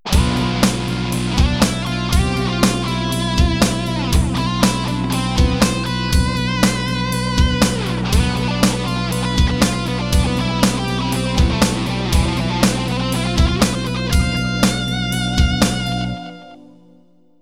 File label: locrian